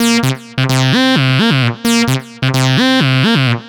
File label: NRG Lead, trance